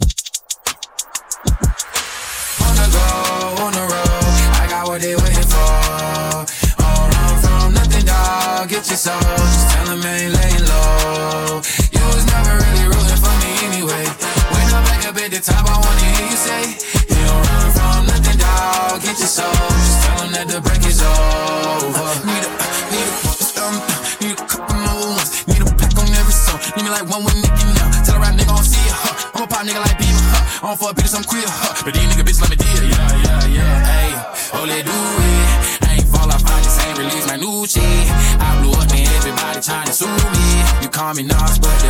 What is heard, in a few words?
Ebm 93 bpm